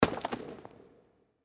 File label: Hockey Puck Net, Hockey Puck Into Net Goal; SoundEffects-Blastwave; Sports